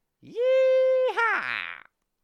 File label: yeeha